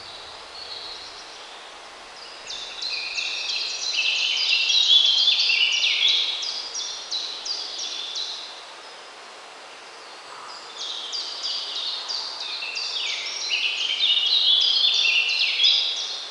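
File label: Ambience Golf Crowd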